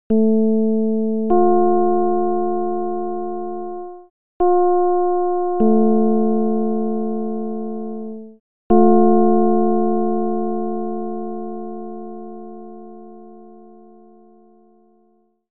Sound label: Ji-34-21-csound-foscil-220hz